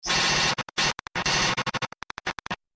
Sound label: glitch